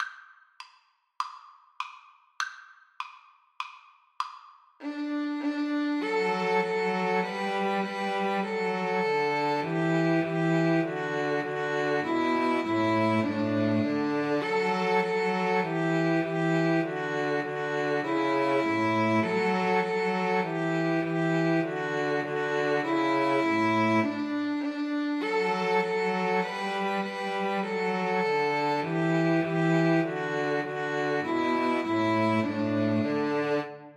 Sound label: Piano Trio